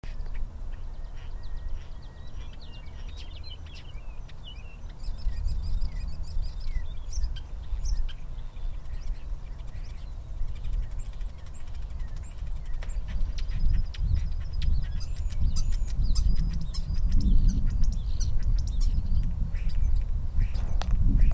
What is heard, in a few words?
Starling